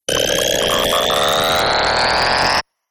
Glitch Power Up; Glitch Sound Effects; sound design

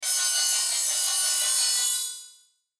Musical Metallic, Musical Metallic Riff Reverb; Metallic Sound; Blastwave

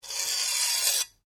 Blade Scrape Slow, Sword; Foley sounds for melee weapons; Swords; Combat

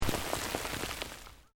Earth Loose Move Short

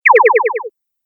Space Future Communication Sound, sci fi

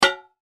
Impact Metal Hit Small Can Bucket; Metal (Sound Effects:Materials); Blastwave

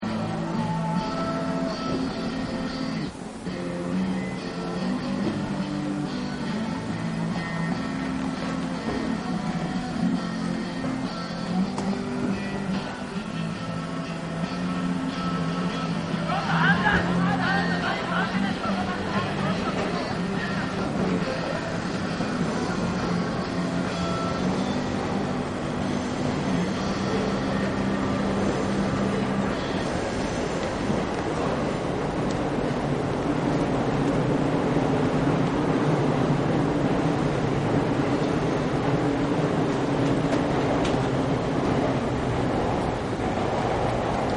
Tokyo Train Garage Band; Tokyo Train Disembark; Trains; Cities; Backgrounds

